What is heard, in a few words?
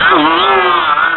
Drown; Link drowning in water